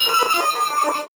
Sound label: VocoBit Noise, 8-bit; musicradar; 8-bit bonanza